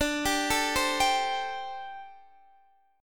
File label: D 7th Suspended 4th, D, down, Guitar Open D